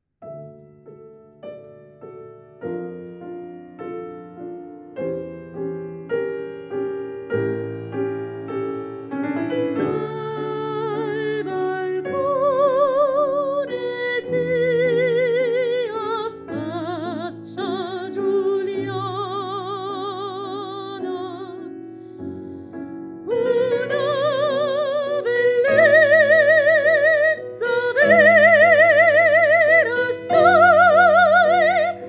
Voce Pianoforte, canzoni satiriche

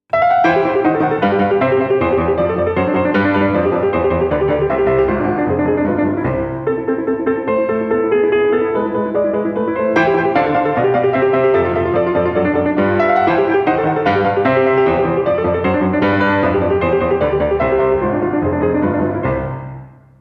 Sonatina n.1 III Tempo Pianoforte

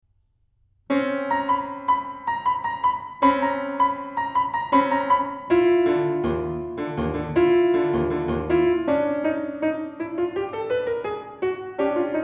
Cinque piccoli pezzi per principianti 3 Allegro moderato Pianoforte

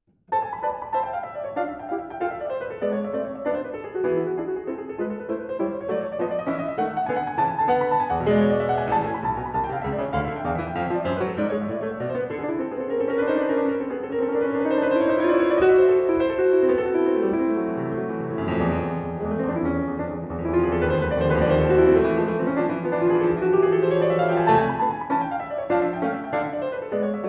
Suite di danze 4 Tarantella Pianoforte

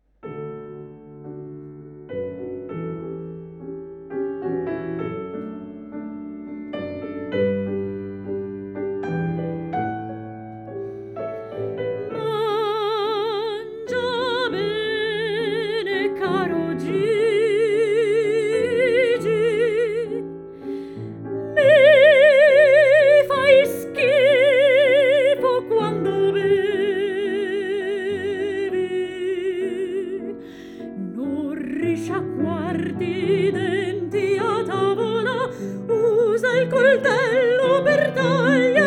Voce Pianoforte, canzoni satiriche

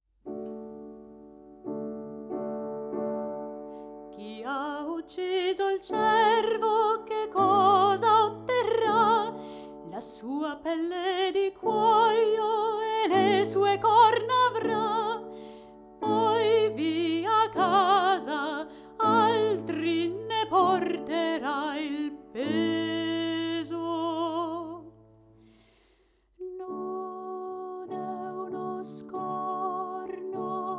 Voce, Pianoforte; Quattro Canzoni per A piacer vostro

